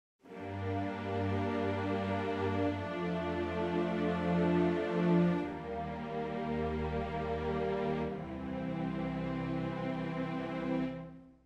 Strings Full Ensemble Sustained

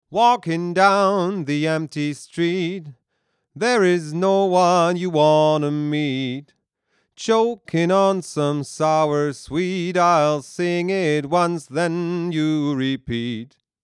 SPL Crescendo duo Vocal SM58 43 dB Gain HP

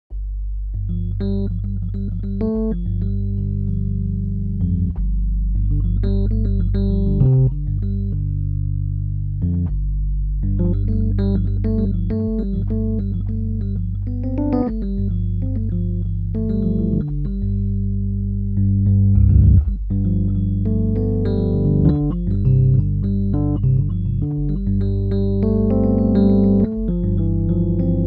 Rhodes Mark1 Classic

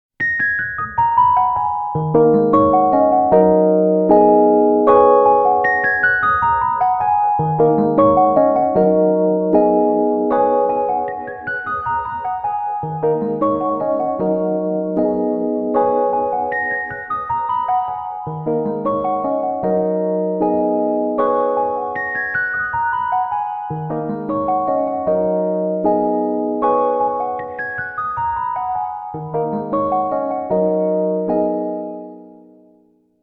Sequential Prophet X Basic EP

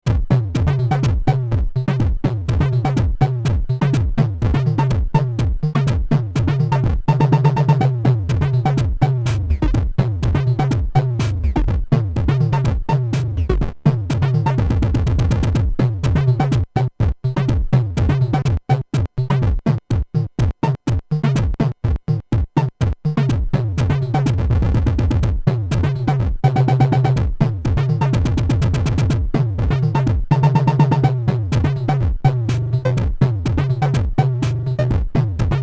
Stutter Spindown Effects; Twisted Electrons hapiNES L

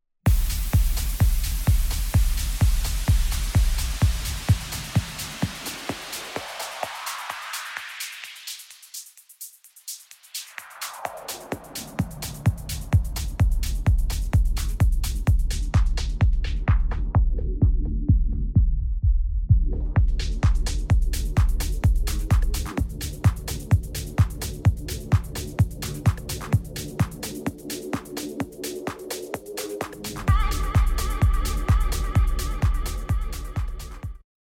RMX 60 Filter

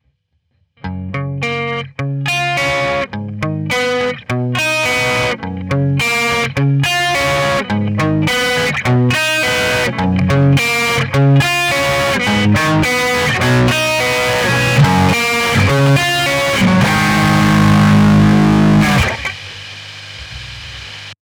E Guitar Picking Sledge Hammer

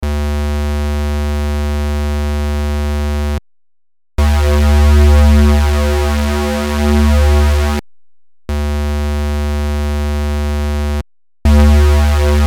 SH01a Normal Square then Unisono Square

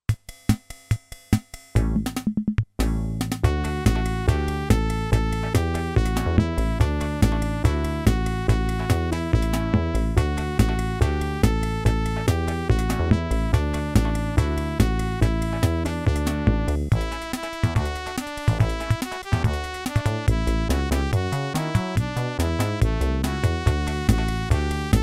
yamaha vss 100

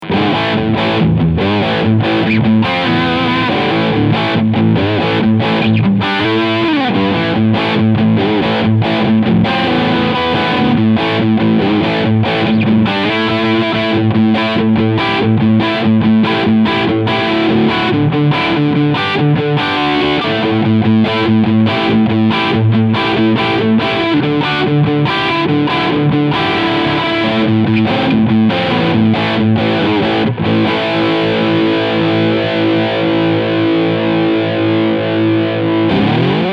Gibson Les Paul Standard 60s Riff Steg Humbucker

